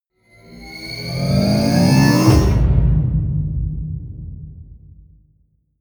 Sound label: promo pad